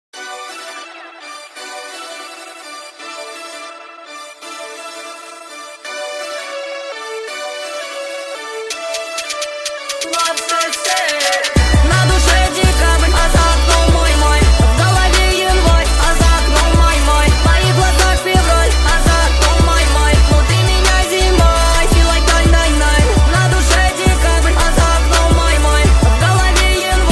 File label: speed up